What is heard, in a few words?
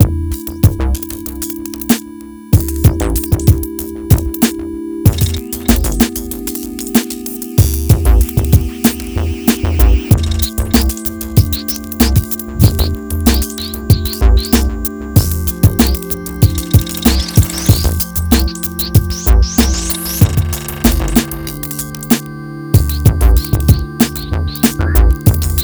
nu Glitch